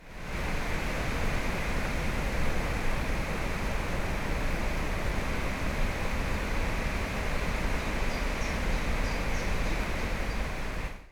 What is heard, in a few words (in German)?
Blaetterrauschen